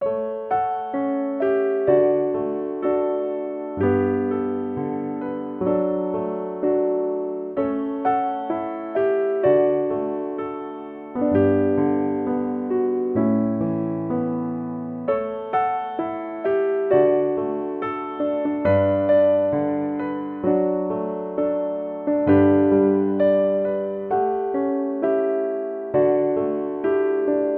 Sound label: solo piano; piano poems b